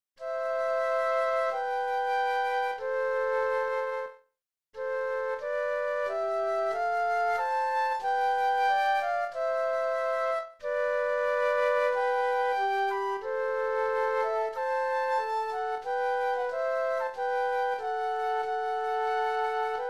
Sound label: March Flute Duet; classical; Mozart